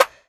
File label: snare roomy; Drums; One Shots; Slate; AURORA Sample Pack; Snares